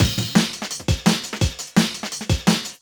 Outside The Box 170, Drum&Bass Breaks; EZ Rollers

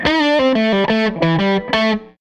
Strat Guitar Loop

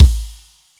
kit, Yamaha Motif-ES8

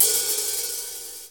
HAT

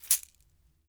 Berimbau Shaker, World Percussion; Sony CS

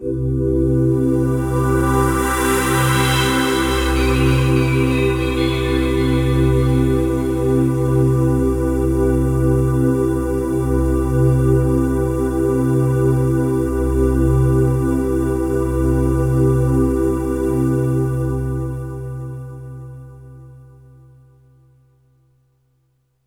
MAJ, Synths; JD-800; ICEMAN

